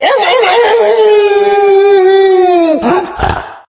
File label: werewolf, halloween; Source Engine; tf